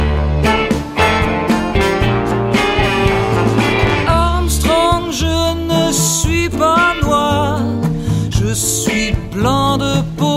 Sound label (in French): sonnerie